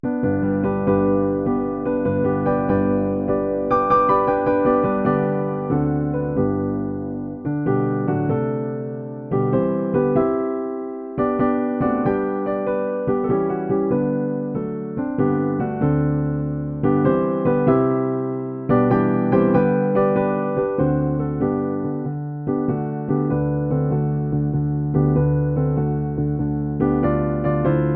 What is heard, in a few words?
mendelssohn morning song op62